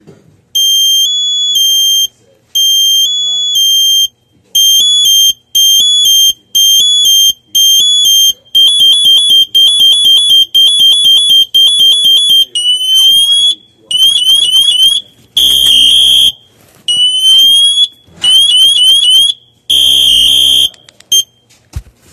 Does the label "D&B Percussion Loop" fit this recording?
No